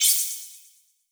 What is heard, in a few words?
Slide In; d4dj groovy mix; Android, iOS, Mobile